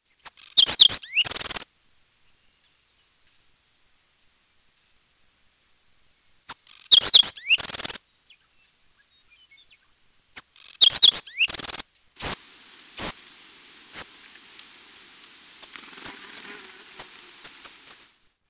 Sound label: black throated sparrow